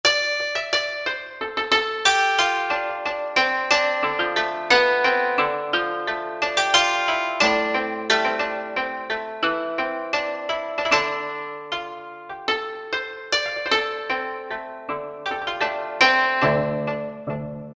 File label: Koto, Korg T3